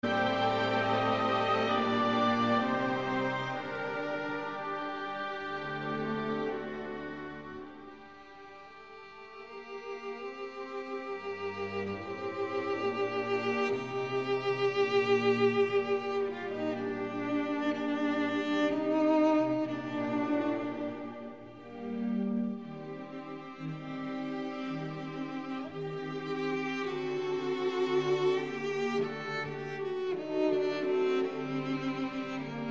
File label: Cello Concerto in B-Flat Major Adagio; G. 482; arr. F. Grutzmacher For Cello and Orchestra